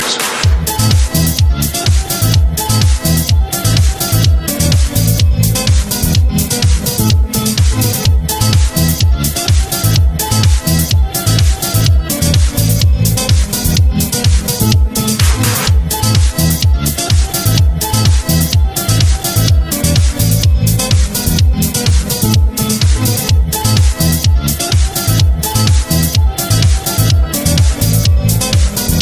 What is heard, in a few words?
Dirty Elektro 140